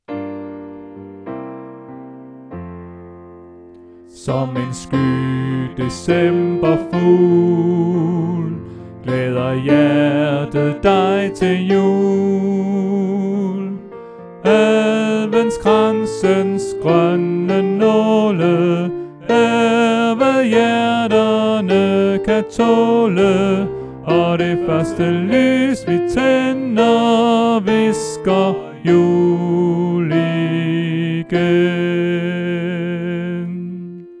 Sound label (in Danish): Sopran; oevefiler